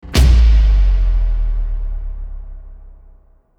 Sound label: Subdrop, transition